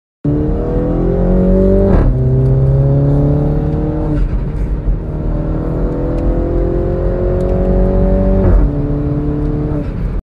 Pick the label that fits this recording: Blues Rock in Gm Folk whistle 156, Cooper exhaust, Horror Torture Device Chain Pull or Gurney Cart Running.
Cooper exhaust